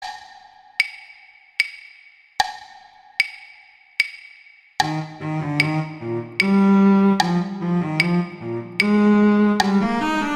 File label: Duet Cello II; Duets; Mozart